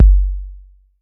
BD 808

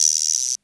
Roland CR-78 Guiro Long; classic machines; Reverb Roland CR-78 Sample Pack

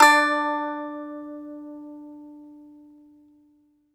BAGLAMA, Plucked Strings

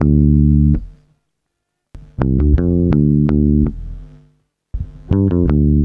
BASS, BASS GTR

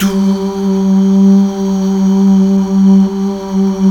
Mal Duh; Best Service Gigapack 1

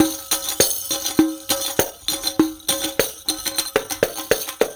Tamborine; Ambient Grooves; World Groove

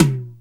E-TOM MID; Back In Time Records; Big Bang

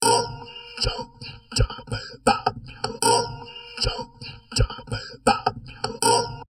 TALK, Drum Loops Crazy Processed

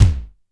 ELEC.DRUMS; Crypton - DrumBase5000

